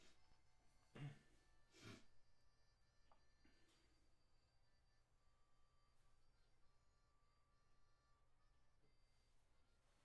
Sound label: vocal add condens